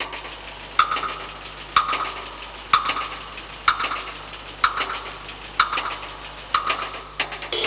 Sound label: 125 Classic Breaks, 125